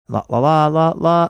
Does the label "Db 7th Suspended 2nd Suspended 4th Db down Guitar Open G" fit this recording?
No